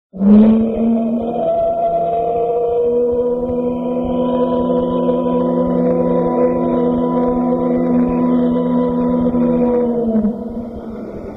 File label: hooter